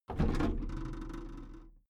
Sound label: big plastic case lid lift medium